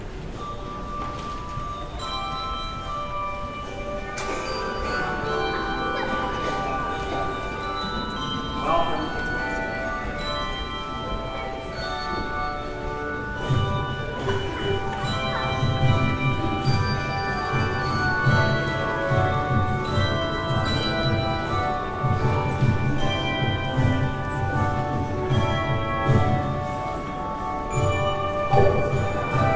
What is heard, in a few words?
Handbells; O Lord We Praise Thee